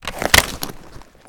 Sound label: uav control unit put away, foley